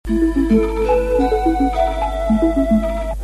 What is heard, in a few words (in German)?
werbung jingle; tele5; tv total